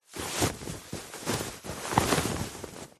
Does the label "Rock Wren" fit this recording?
No